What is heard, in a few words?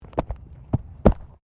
Body movement Fall Medium Double; Body movement foley to simulate falls and deaths; Wrestling And Struggling